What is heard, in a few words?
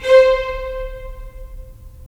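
strings violins, right; Roland - LA Composer vol. 4; 90 s Sample CDs; Violins Sh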